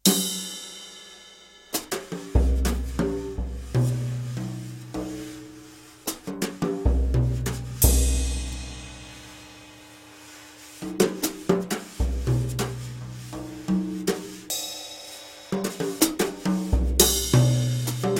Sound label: drums